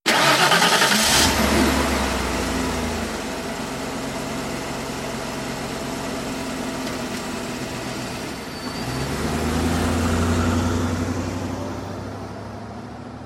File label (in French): voiture; au secours du professeur